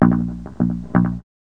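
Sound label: bass tone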